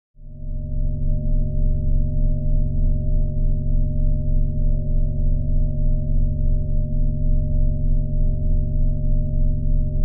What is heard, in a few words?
Space Station Ambience